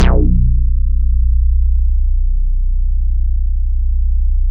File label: STEREO BASS L, Bass Analog & Synth; USB Soundscan vol.12